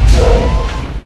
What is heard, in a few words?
lift; gmod; lvs star wars; atte